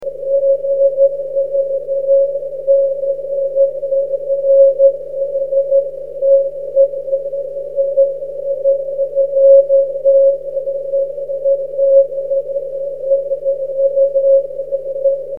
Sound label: cw; 23cm